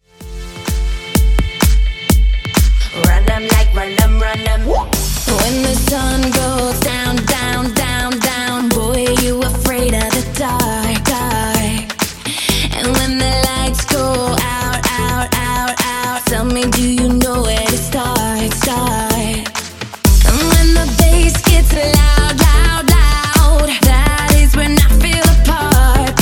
Clubbing, 127bpm; Rebeat

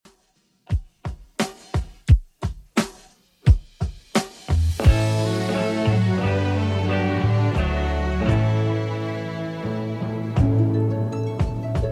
60s Redrum 87bpm

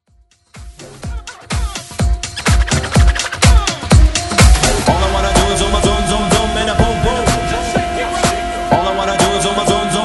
Saxamaphone Vocal

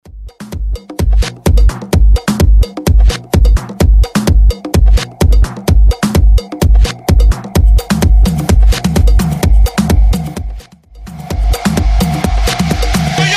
Bootleg Banger 128bpm

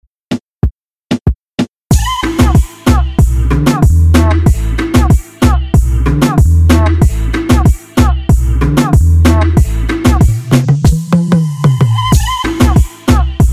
Top 40 Extended; Redrum

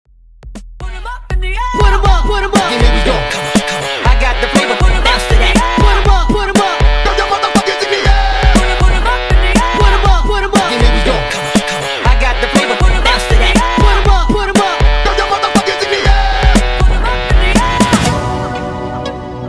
Funk Breakz Intro Outro Transition 120 105Bpm

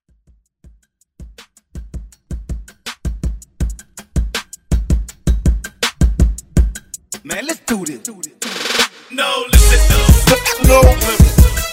Throwback Hype

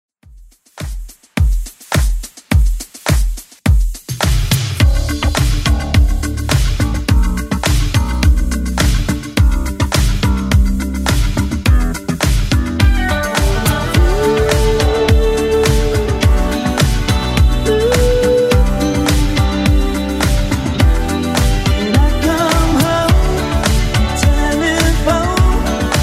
80s Club Edit 105 bpm

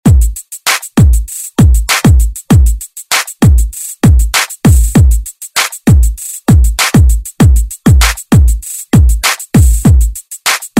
Top40 Redrum

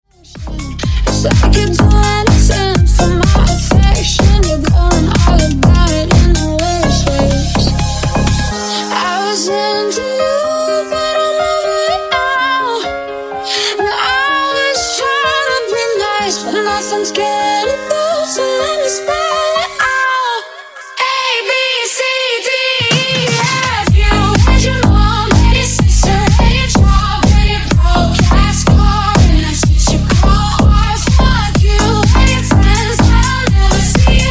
Funk Remix 125 Bpm

